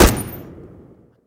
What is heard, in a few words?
Browning 303 RH inner end, weapons; DCS